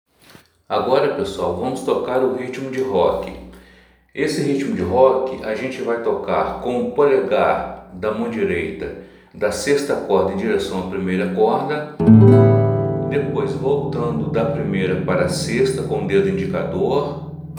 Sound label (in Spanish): ritmo rock